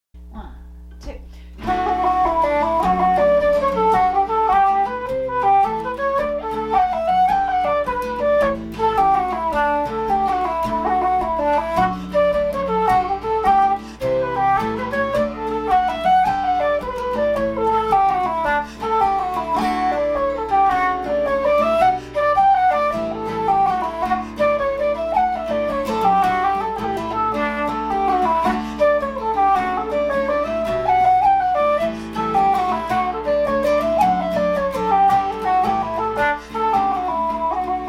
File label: Flute and guitar